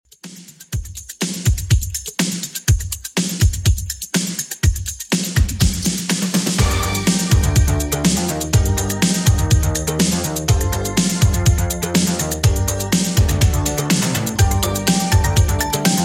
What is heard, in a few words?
80s Redrum 123bpm